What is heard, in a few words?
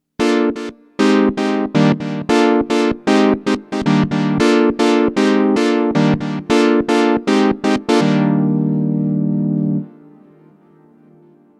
SH-4d Model Chord